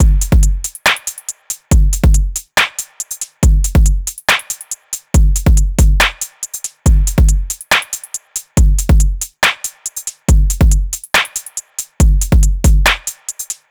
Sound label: deep groove